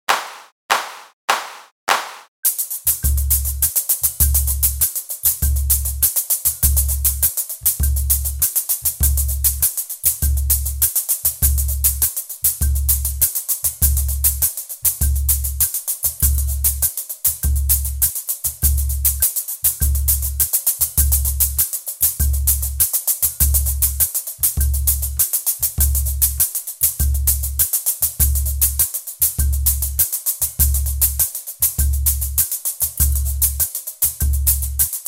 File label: Tamborim backtrack; backtrack 2